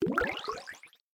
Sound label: bubble pop effect